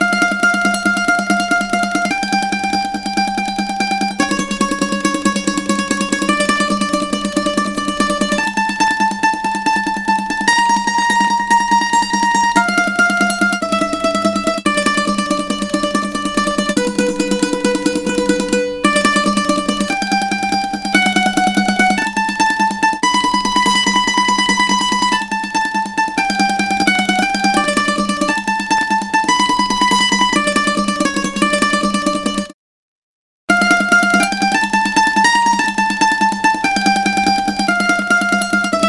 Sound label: Mandoline; MTV Fan Attack